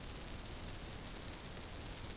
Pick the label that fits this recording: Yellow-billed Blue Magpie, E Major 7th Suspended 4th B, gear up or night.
night